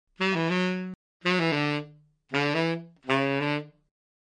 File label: tenor